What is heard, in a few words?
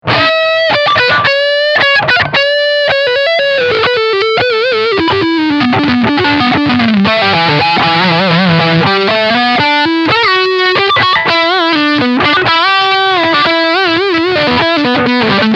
Lick High Gain Front Pickup